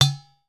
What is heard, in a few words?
UDU